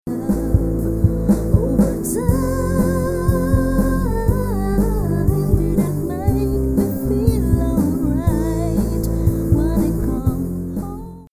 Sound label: 3000Hz sweep